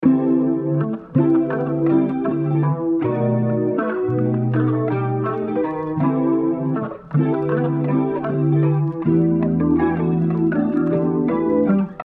160 BPM Em; Novelty

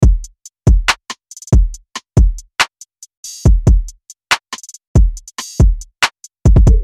Hip Hop Rap 140BPM

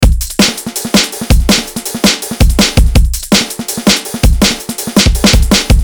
165 BPM; Broken Bones; bf sample pack bundle; Unison

